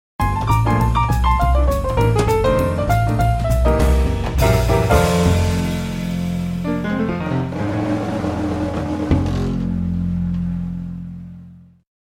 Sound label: classical jazz